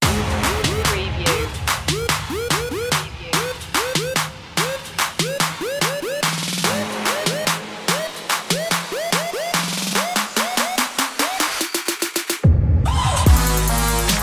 808 boom no vocal phrase

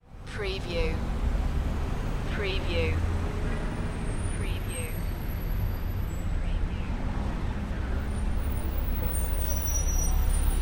Traffic Background

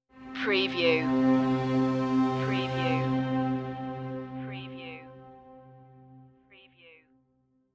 strings in a dark place stinger

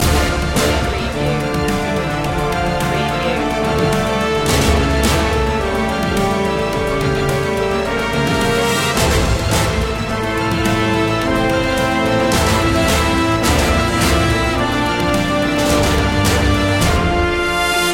battle in 8-bit space loop

